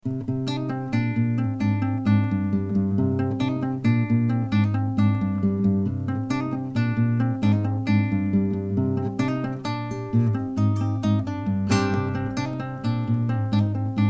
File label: acoustic